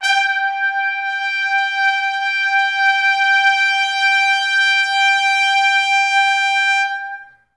G4, Pop Brass; Best Service; ProSamples vol.19; AKAI

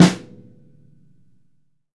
Snare mf; Digital Sound Factory

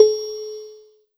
touch tone; Sonic Foundry (Sony Creative Software); Synchro Funk; One Shot Sonics

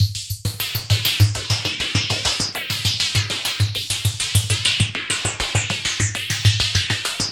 WHITE NOISE BT; Zero G; Malice In Wonderland

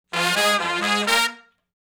Shuffle Blues Riff (Bb) unison, Horncraft for R&B; Sections

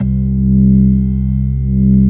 ORG B3, organs; Sound & Vision; Gigapack I CD 2

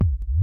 Kicks, Elektron MachineDrum; SPS1 MKII

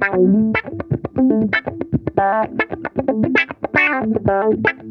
DIZZY, Funk Guitar; 90 s Sample CDs; Zero G

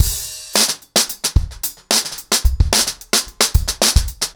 110BPM, acoustic drums, drumloops

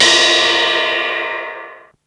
Ride Filter, Ensoniq Mirage drum machine

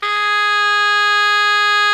BARI MF G#3, Sax; Giga Samples Collection; BARI QUICK

